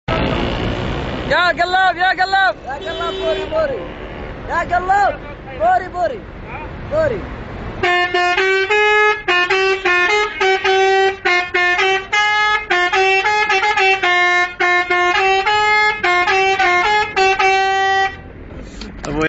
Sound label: horn dundundun